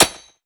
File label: frag physics bounce concrete hard; gmod; viper